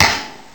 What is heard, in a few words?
HC-2 Clap; Boss HC-2